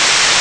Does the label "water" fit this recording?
Yes